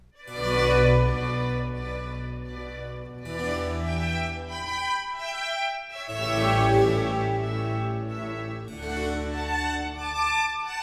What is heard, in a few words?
Concerto in C minor for strings and harpsichord II. Largo; RV 119; A. Vivaldi; Concertos and Sinfonias; 尼古拉斯 · 麦克吉根